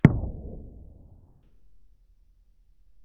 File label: Distant Explosion